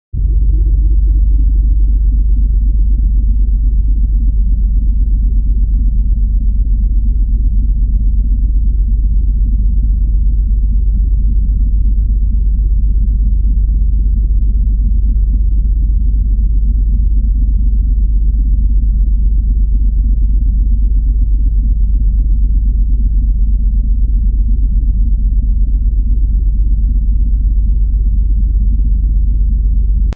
Sub Pressure Move, High quality sub bass tone for tense or creepy film scenes; Deep Tones; Sound Design